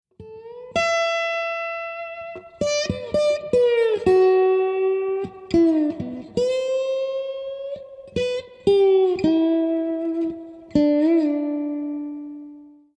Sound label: solo slide guitar